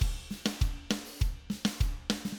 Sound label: Cumbia Verse